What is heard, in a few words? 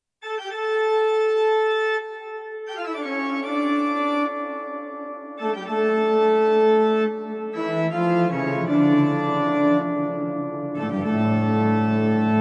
Organ Hall; Sony DRE 2000; Sony MU-R201